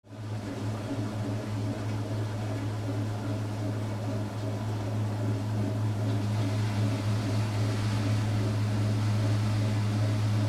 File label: Washing machine washer spinning distant perspective; appliances